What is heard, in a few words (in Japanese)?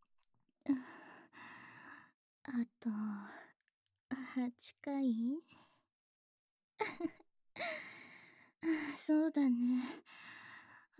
本気くい打ちピストン×ぐぽぐぽ耳舐め ボイスのみ; 鈴姉